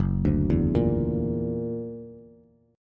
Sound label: Gb Augmented 7th Gb down Bass; Gb+7 arpeggiated